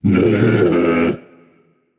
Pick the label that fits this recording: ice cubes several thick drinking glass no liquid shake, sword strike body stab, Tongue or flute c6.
Tongue